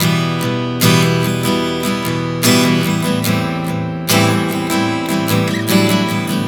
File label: Progression 074 Em-Am, Acoustic Guitar, Chord Progressions 074 bpm; Sonic Foundry; Songwriter Acoustic Guitar Companion